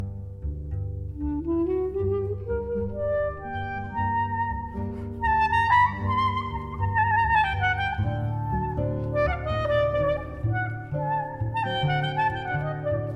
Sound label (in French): Clarinette Extrait Klezmer